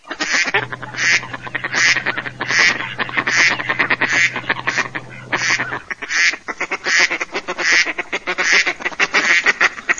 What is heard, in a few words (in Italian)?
GERMANO